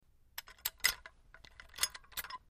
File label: Locking A Steering Wheel Lock; The Club Lock